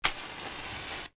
matches, casa

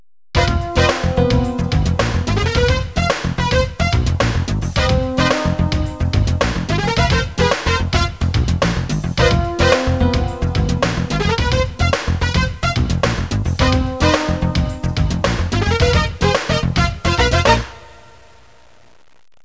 suonerie businessy

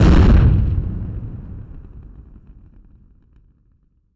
armi

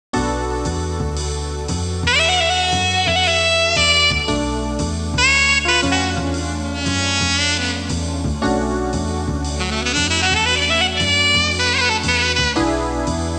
suonerie musica jazz

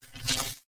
suoneria botton; beep

